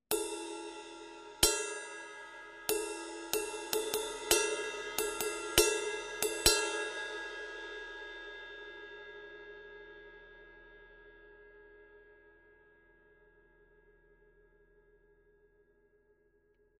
Meinl

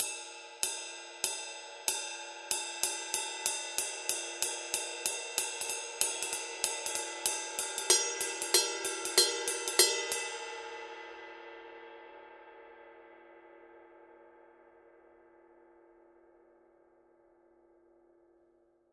Paiste signature 22 blue bell ride pattern

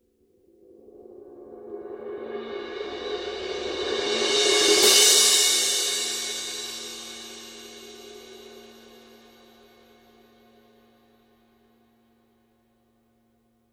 Paiste 2002 20 medium Crash swell

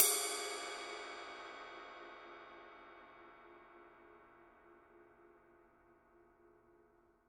Paiste signature 21 dark energy ride mark ii body

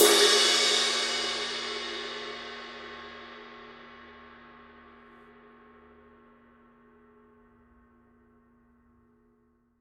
Paiste signature 21 dark energy ride mark ii edge

